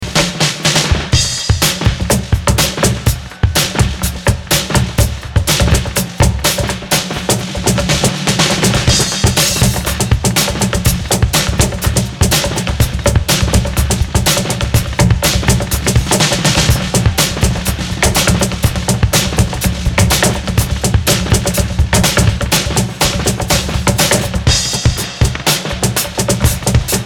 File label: Drum Dub